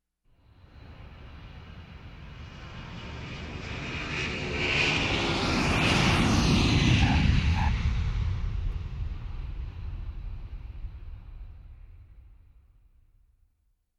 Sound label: Airplane Jet Landing With Tire Squeal